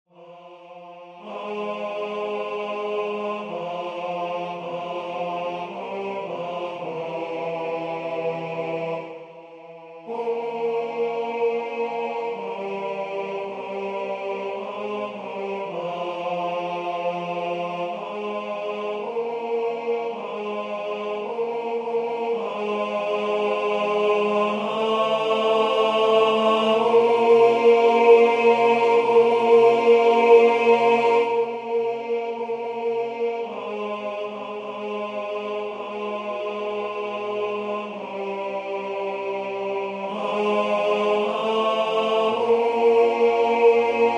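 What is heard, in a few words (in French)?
tenor, Musique sacrée; Parce Domine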